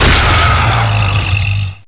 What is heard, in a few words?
die boss; Devastator